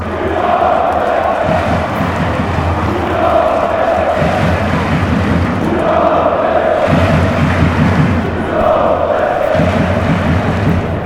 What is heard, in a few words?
we travel, fan chants